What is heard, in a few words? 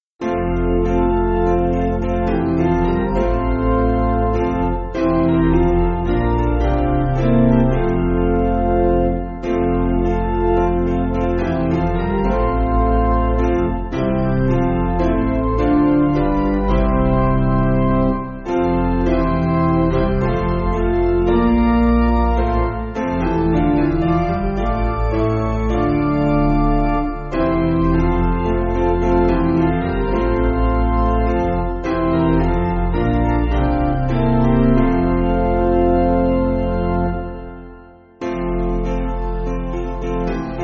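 Piano, Backing Files for Churches; I Lay My Sins; Prysgol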